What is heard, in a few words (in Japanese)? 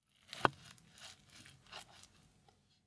卵焼きを切る音